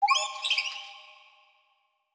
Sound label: Cave Spirit